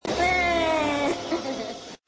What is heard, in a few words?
whine